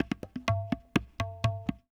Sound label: PERCLOOP ETHNO 125; eJay